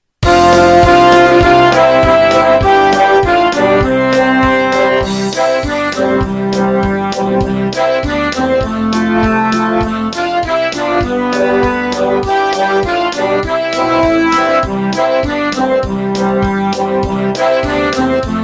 jingle bells

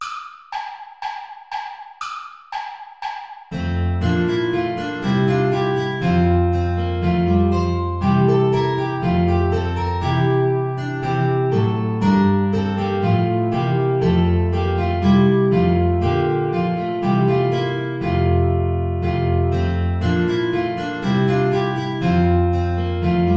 play along without guitar; praetorius michael bourra

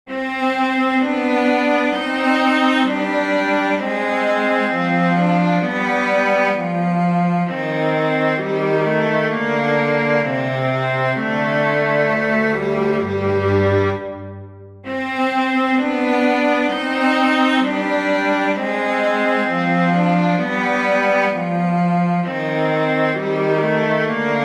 for viola cello; bach johann sebastian choral aus der tiefe rufe ich

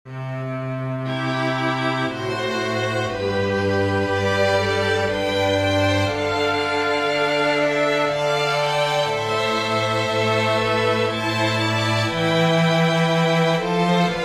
aria for french horn tuba strings

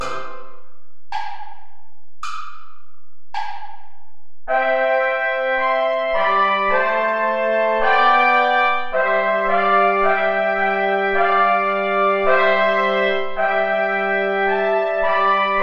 play along without trombone

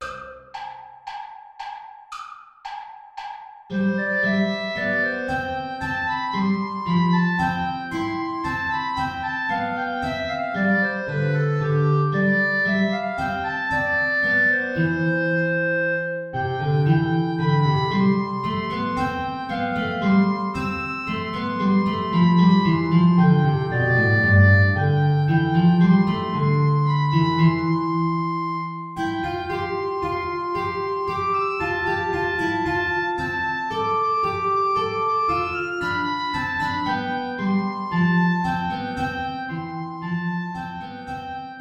air play along without contrabass

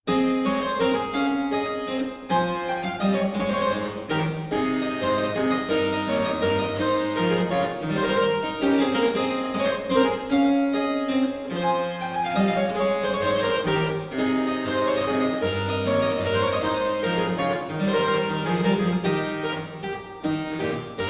menuet; telemann georg philipp; sept fois sept menuet twv

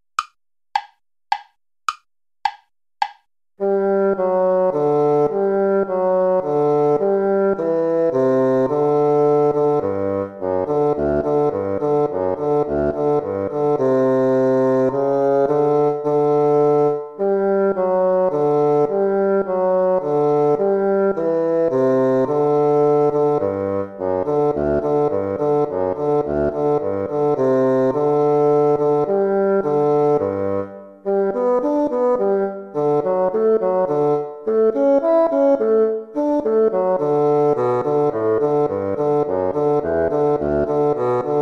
minuetto play along; hook james

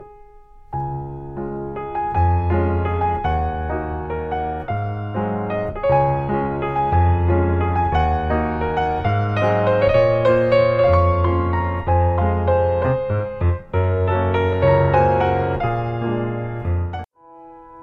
piano; happy new year

